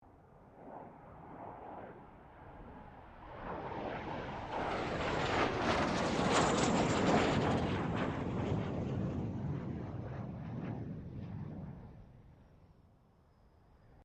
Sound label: FA-18 Hornet pass; RAAF FA-18 Hornet; Airplanes